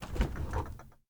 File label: DOOR Fridge Open; mono; Universal Sound FX; DOORS & GATES